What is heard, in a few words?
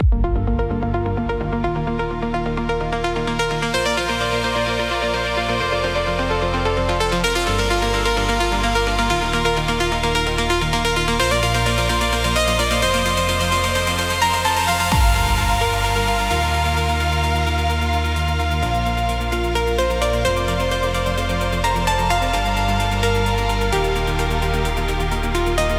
128BPM